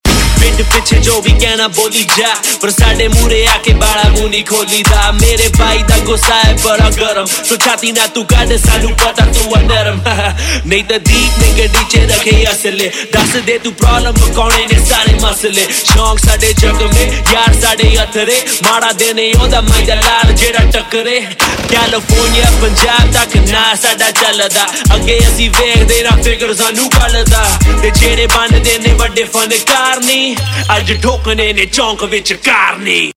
Rap Ringtone, Punjabi Pop

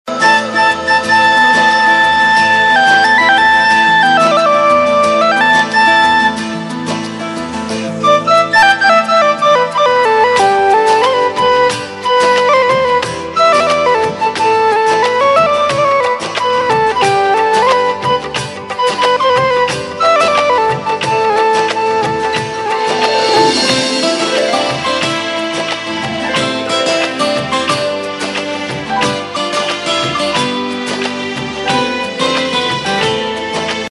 Instrumental Tune; Zee TV; TV Serial Tone

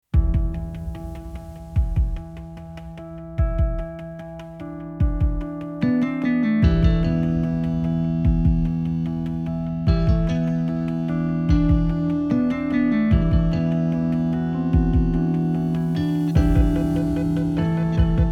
Theme - Instrumental; Kabirs Theme; Bollywood; War

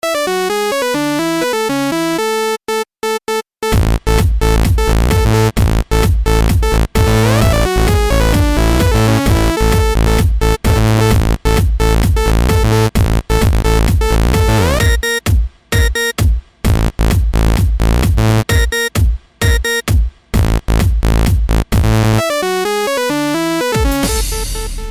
Remix

